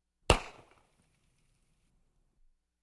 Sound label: Flare Gun Shots